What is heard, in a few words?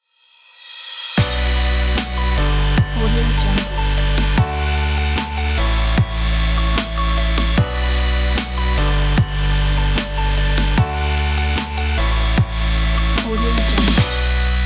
dubstep inspiration